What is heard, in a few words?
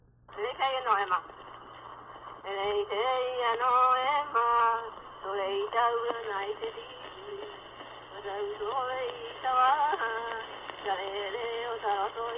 Name-wreath chant, Hawai'i; World Music; Hawaiian; for Queen Emma; Na Leo Hawai'i Kahiko - Voices of Old Hawai'i